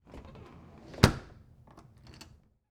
Door Close With Lock; household

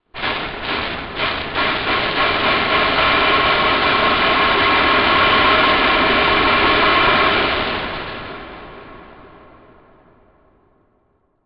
Title Transition Delay Long; Production Element Title Transition

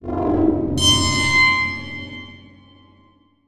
Title Transition Evolver Short; Adobe; Production Element

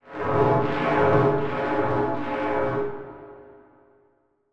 Transition Whoosh Multiple; Production Element; Title Transition; AdobeCC

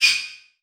Multimedia Internet CD-Rom Flash Ding

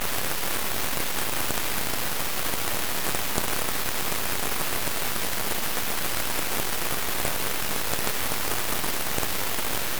crackle

